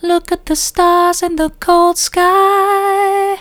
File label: soft, vocal